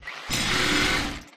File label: drill; Barotrauma; Neurotrauma